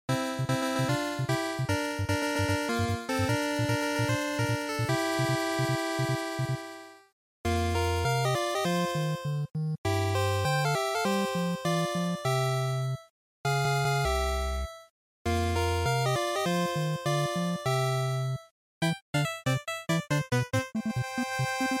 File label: NES Shooter